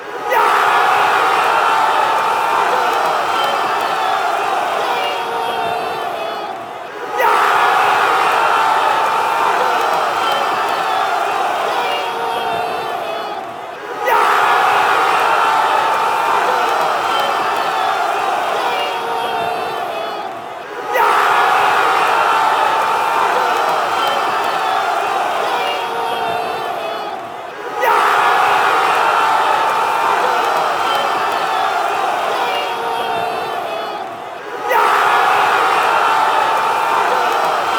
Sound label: goal, fanchants sweden